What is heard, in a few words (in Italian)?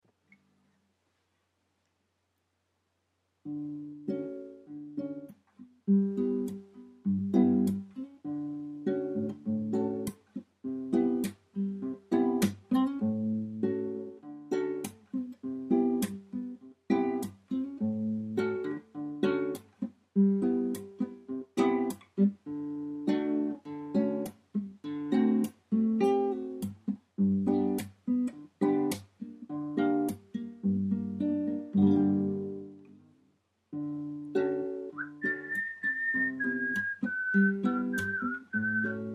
solo musicale; Italia